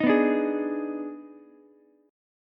D sharp Major 7th Suspended 4th Sharp 5th D sharp Ukulele, chord; strummed; JGuitar